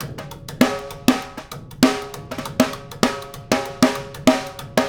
Snare Pattern; Drums; Sonic Foundry; Essential Percussion

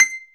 baglamas C 6

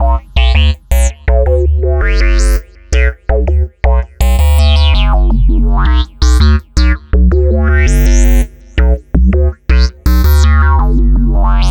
Synth Bass

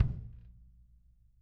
KICK, Drum Kits

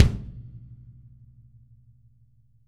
kick, Drum Kits; Sonic Reality

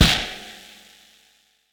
FX BD, Drums Acoustic; USB Soundscan vol.10; AKAI; 90s sample CD; ALL BD

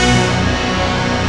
Zoom Pad B; Proteus 2000; Multisamples; Digital Sound Factory Proteus 2000

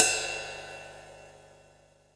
RIDE, Drums Acoustic; USB Soundscan vol.10; AKAI; 90s sample CD

